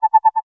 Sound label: gentle alarm; wake-up tones